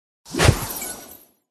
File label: cat attack voice bottom